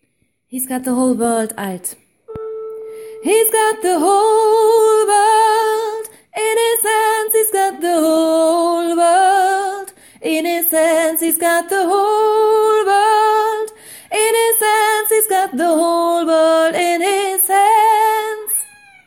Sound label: He's got the whole world alt